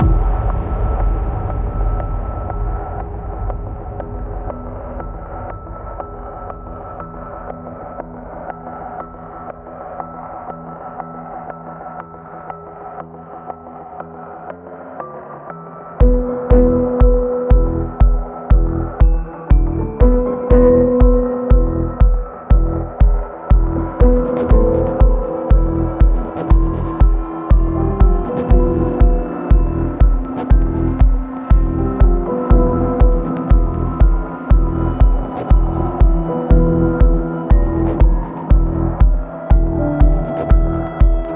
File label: music knife-suspense loop